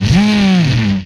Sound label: Rev, Retro Arcade Sounds, Vehicles